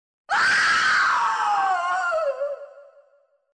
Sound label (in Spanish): grito de mujer asustada; SONIDOS TERROR